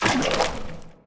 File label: paint blob splat; Portal 2